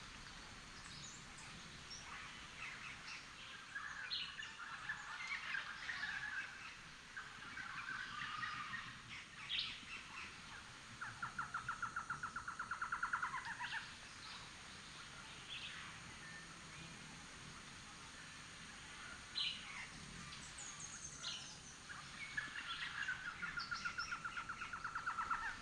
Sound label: jungle SFX